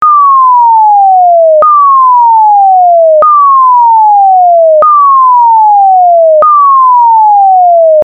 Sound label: Tone